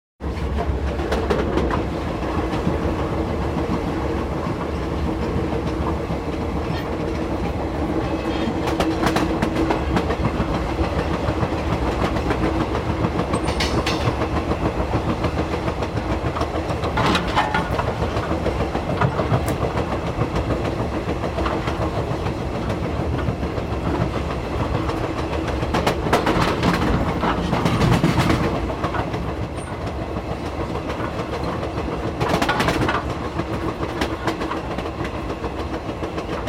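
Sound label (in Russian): звук в коридоре выход в тамбур и возвращение в коридор вагона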